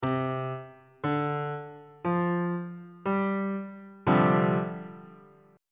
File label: f double sharp dominant 7th suspended 4th 1st inversion on bass clef